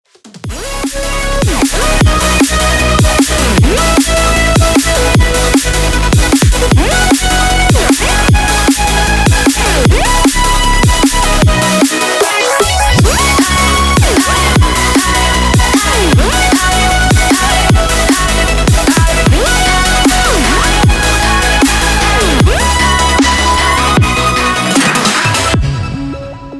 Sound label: dnb